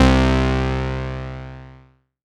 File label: pad C2, Korg MS-20; Pads; Polyend; Simulacrum Sounds; 1 Shots; Synths